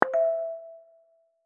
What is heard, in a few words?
notify normal new